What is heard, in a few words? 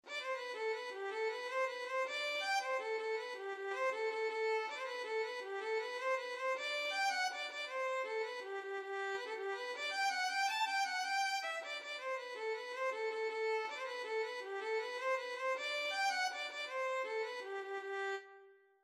violin